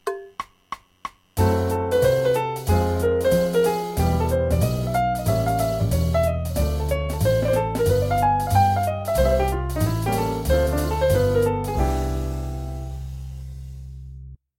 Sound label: IVm7b5 IVm, jazz piano